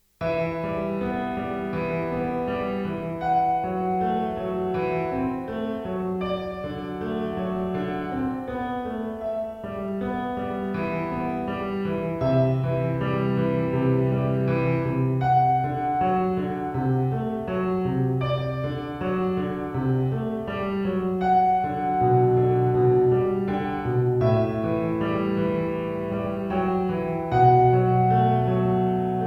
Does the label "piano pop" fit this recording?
Yes